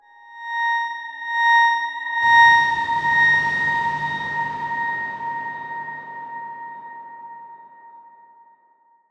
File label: Darkswarm A#5 pp; HSS Ableton Pack1